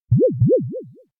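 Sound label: electronic boyonyon excite; anemoi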